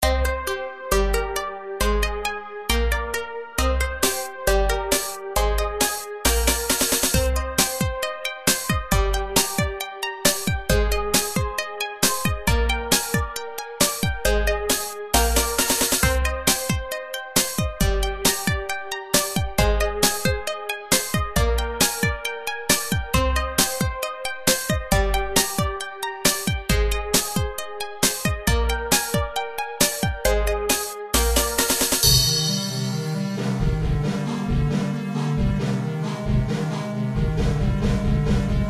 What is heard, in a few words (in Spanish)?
Melodía F; deliantra